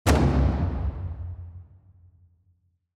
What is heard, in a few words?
Taikos